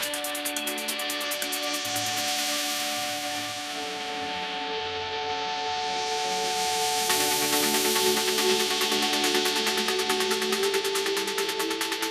ringtone kpop

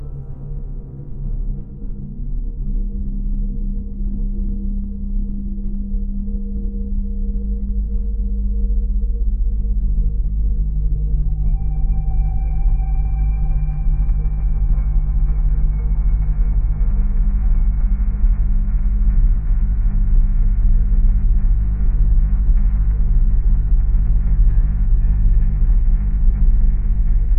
ambient space

